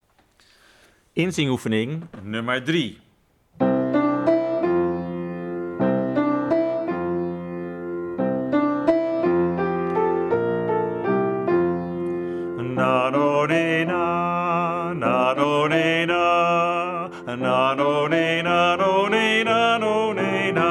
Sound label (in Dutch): inzingoefening maa moo mee maa; theaterproductie Only the Lonely